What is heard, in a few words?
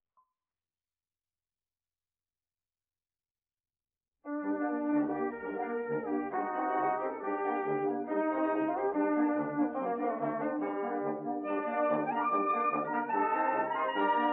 HUNGARIAN DANCE Orchestra denoised; Columbia Orchestra; BRAHMS